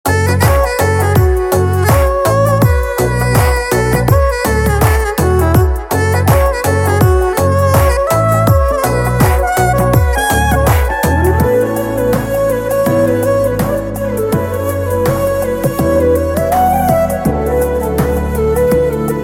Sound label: First Time Heart Beat Love Bgm